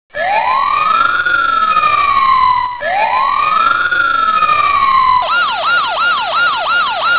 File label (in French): sirene police